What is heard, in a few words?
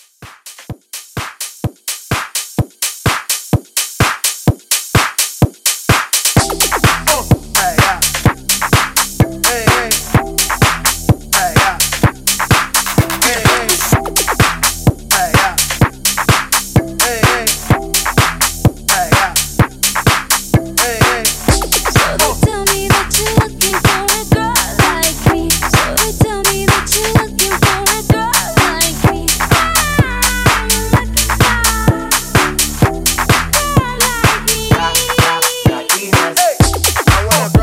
Top40 Pop Re Drum 127 BPM